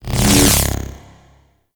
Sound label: Ground Laser Retract; Enemies; Abilities; Warframe; Teralyst; Bigalyst